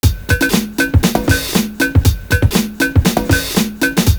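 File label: PHAT BELL, 119 bpm; Univers Sons - Kitch 70s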